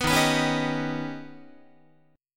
Eb Augmented 7th Eb down Guitar; Eb+7 strummed; JGuitar

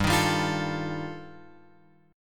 G 7th Suspended 2nd Sharp 5th G chord; G7sus2#5 Chord {3 6 5 X 6 5} strummed

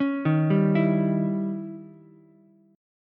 D sharp 7th Flat 9th, Ukulele, C6; arpeggiated; D#7b9; 6,3,3,7; JGuitar

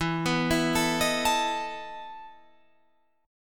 E Major 7th Suspended 4th, Guitar, Drop D

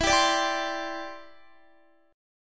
E Minor 7th Sharp 5th, down, Guitar; strummed

